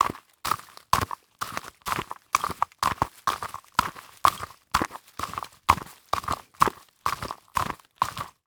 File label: Foley Footstep High Heels Leaves And Twigs Debris Walking Quick; Foley Footsteps